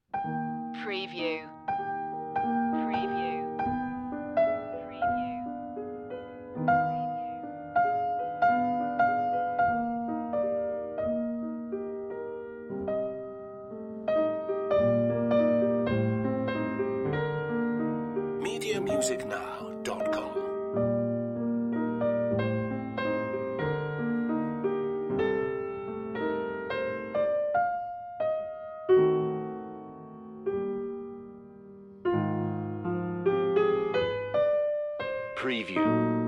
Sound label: grieg arietta op 12 no1 from lyric pieces